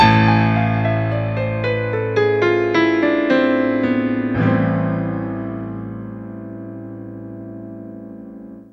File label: Piano Grand Finish